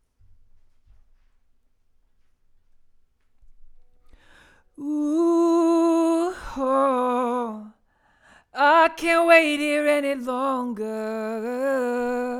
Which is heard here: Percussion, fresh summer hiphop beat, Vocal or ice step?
Vocal